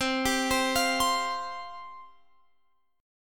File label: C Suspended 4th C, Guitar, Open F; Csus4 arpeggiated; x,19,19,19,20,19